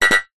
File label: science fiction console error feedback tone; zapsplat